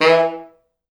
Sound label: E2, Pop Brass; Best Service ProSamples vol.19; AKAI